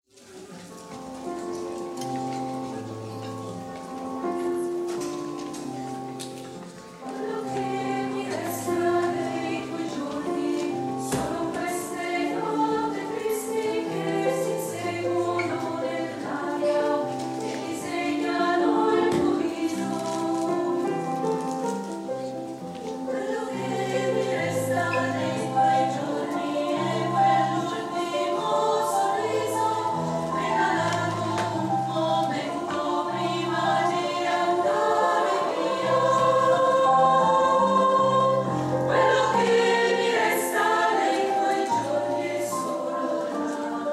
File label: female Choir